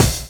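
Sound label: drum chop; AMG Gota Yashiki Groove Activator; GOTA GROOVES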